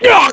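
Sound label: Damage, Korean; heroes; Phillop; kings raid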